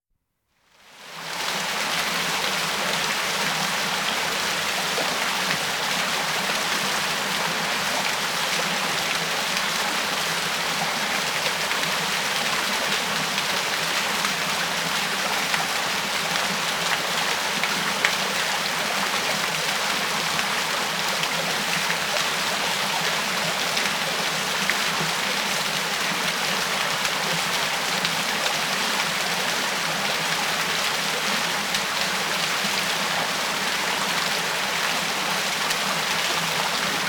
Waterfall In a Forest; Dr. Sound Effects; 210 Nature Sounds - 20 Hours of Relaxing Natural Ambiences for Meditation and Sleep

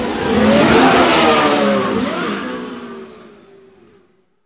race car; 1000fx

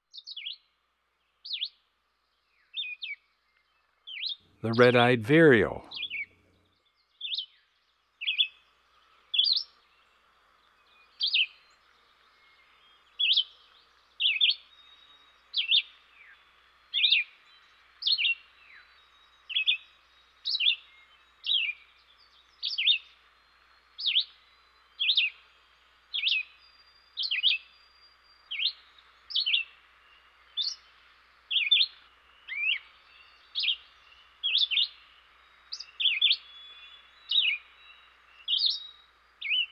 Red-Eyed Vireo; Eastern North America; Dan Gibson; Wildlife Identification By Sound

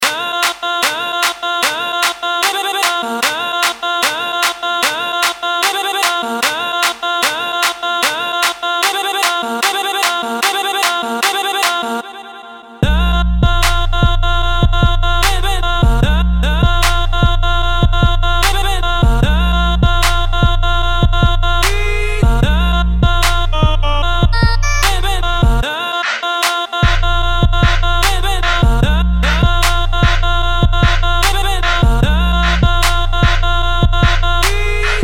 Trap

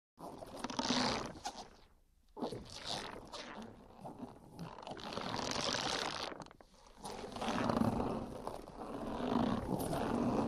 Rubber Processed Stretches; Hollywood Edge Foley Sound Library

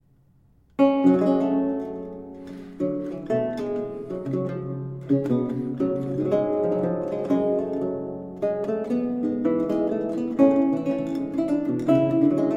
Courante in G

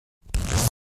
Zipper Action Pans From Left To Right; Household

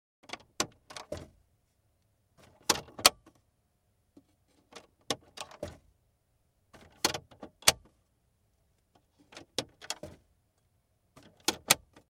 Glove Compartment Door Open Close; Cars

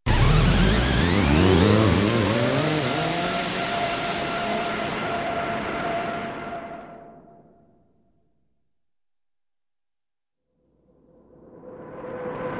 Multi-pitched Buzzy Scratchy Ufo Sounds; Hollywood Edge Cartoon Trax

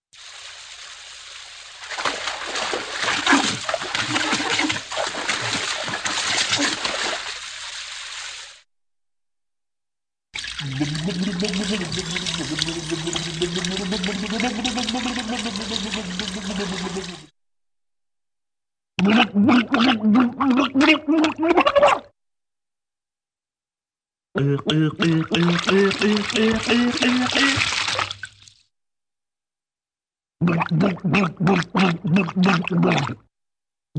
Shower In Bg Funny Drinking; Hollywood Edge Cartoon Trax

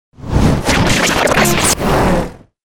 Swinging Bridge, Stabs & Bursts; Best Of N2O Library

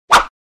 Swish Single

